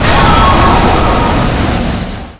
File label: gigantic storm